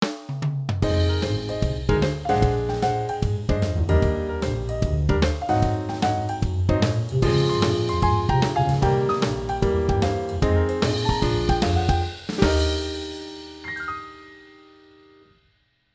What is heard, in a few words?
jazz